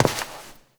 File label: Step; Warframe; Koumei